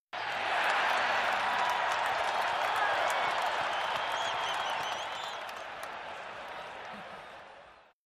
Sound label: Football Crowd Chee, sports arena football